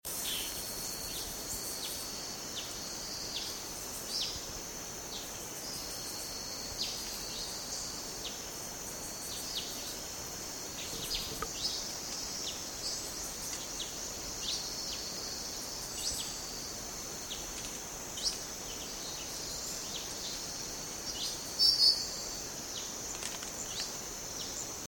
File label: cucak ranti blue winged leafbird chloropsis cochinchinensis